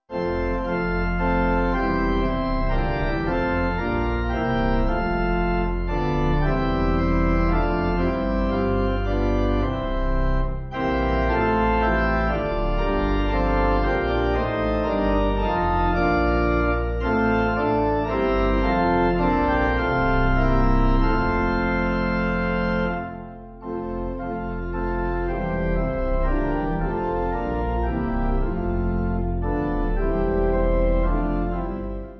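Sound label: Pipe, Pipe Organ backing; Come Holy Ghost Our Hearts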